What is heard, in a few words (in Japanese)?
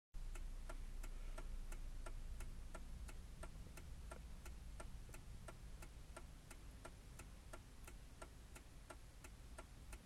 もっとも高級感あるウインカー音